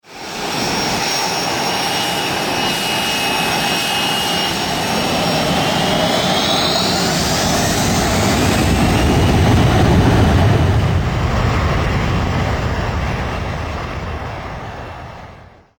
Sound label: jet take off